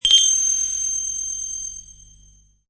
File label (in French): SUCCES; MOBICLIC161